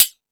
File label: FX Sounds, Drum Machines